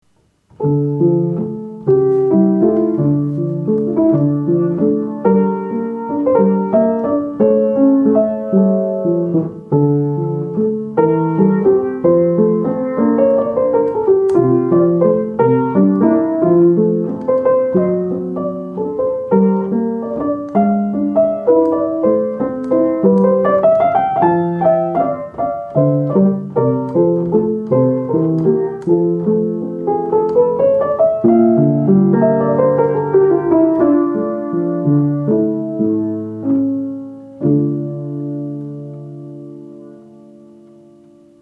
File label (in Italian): verset per organo; venti versetti